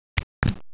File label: HIT